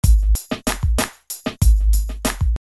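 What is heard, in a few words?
slow one, rhythm loops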